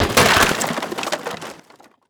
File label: wood debris crash